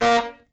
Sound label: honk; twitch